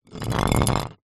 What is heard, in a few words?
household glass jar containing water short roll concrete